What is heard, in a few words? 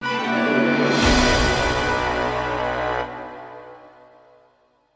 Wrong; Millionaire Soundtrack; UK Revival (2018)